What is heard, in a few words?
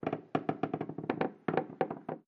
Creaks Wooden Stairs